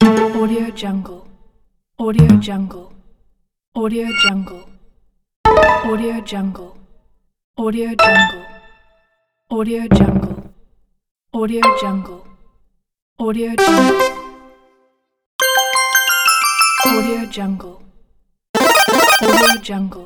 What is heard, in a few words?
tablet menu sound effect pack